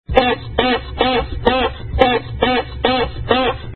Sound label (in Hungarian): duda gumi; kocsitest; JELZOBERENDEZESEK